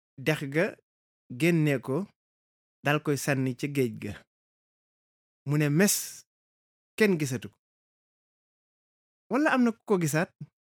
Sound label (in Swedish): bip